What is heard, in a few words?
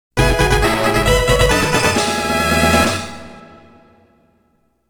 Fanfare Pokémon Caught; Pokemon Red Green; Super Music Collection